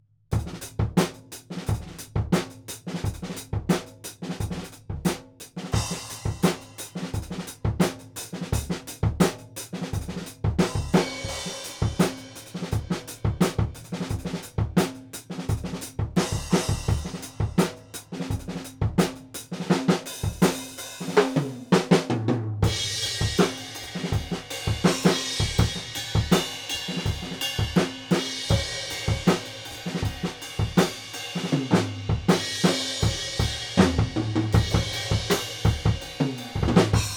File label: Drum Room V69